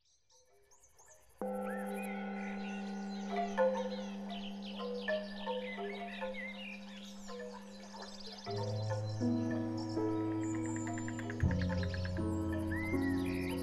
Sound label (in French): Piano zen